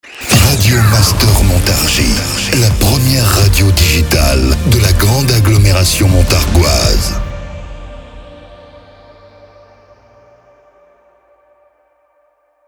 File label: Jingle SLOW; Radio Master Montargis; Habillage